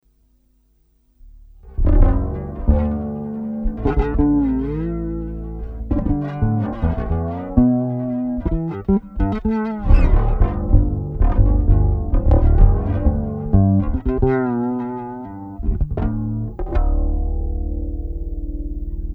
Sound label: bass